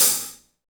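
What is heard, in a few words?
Reg. open hi hat, Roland BK-5 hi hats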